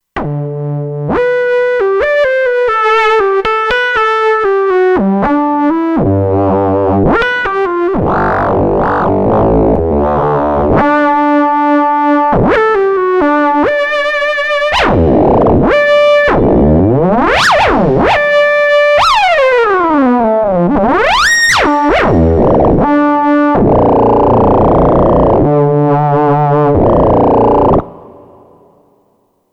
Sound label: FM Slider Play, Kurzweil K2500X